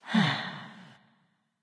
sigh; smite

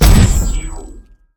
hit; smite; heimdallr

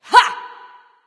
nv yay; smite; awilix

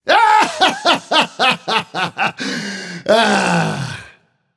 laugh; smite; Vulcan

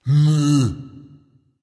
tongue, voice; smite; voicepack; ymir

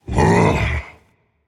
sigh rage; chaac

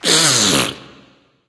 tongue; smite; voicepack; neith

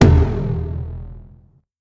Fire; smite; raijin

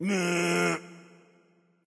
grunt tongue; bacchus

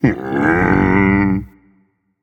boohoo, dragon; fafnir

